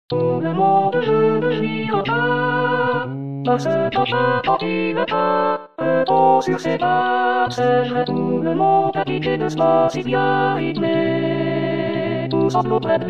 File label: Alto; Variété